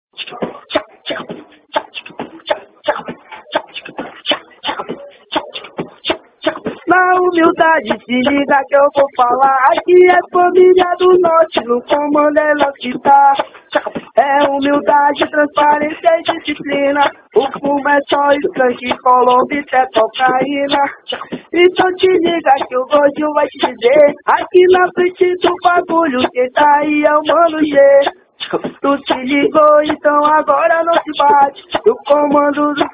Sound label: funk